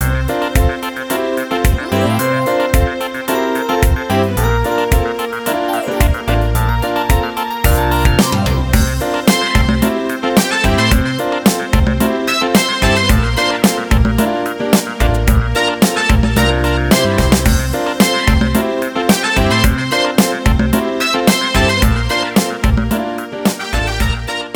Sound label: Brass Fever